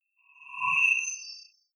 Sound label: swell short beam; sweeps-swells